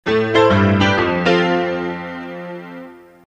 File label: western